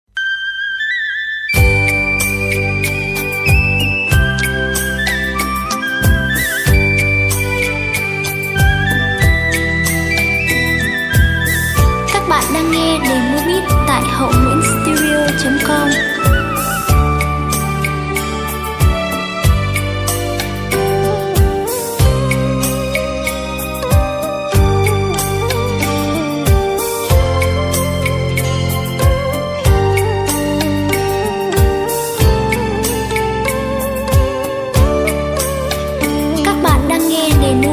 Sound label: beat, playback